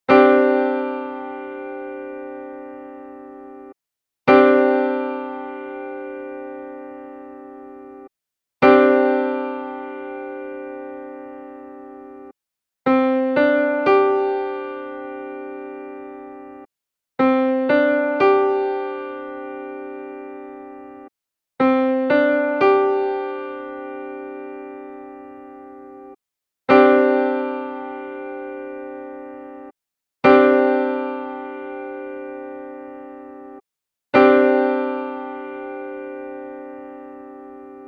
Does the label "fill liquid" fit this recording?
No